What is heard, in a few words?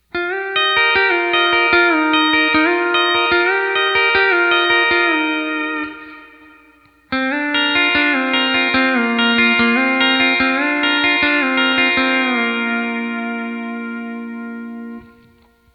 D13 String 6 Yoyo; D13 Copedant Tabs